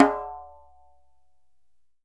DJEMBE, African Perc

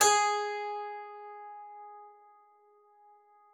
KCYM G#4, KLAVICYMB; Xsample VOL-8

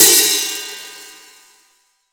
FLANGE 14 HH

